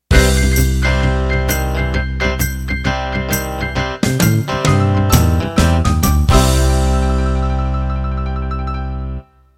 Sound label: Country Shuffle End